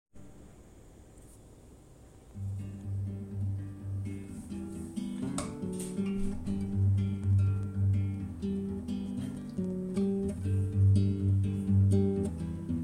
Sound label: plays guitar